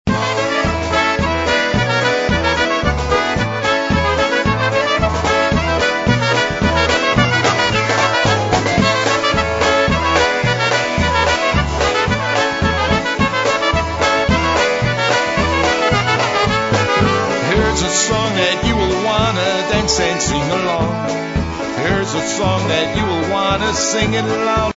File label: polka